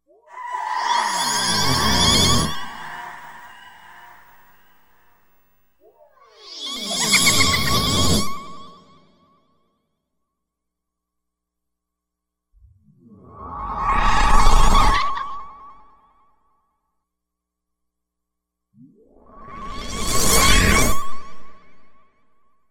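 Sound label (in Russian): fast flybys of a witch overhead with laughter; Для Хэллоуина; Коварный злой смех старой ведьмы, забавный смех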